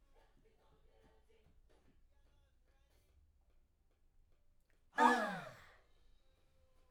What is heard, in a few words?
Backs; SCHOEPS